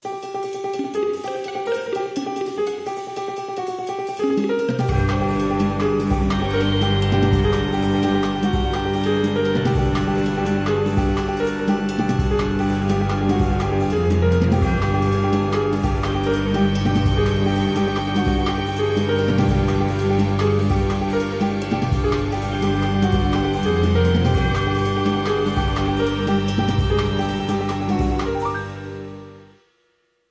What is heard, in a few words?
Organ